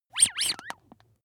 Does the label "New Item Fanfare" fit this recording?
No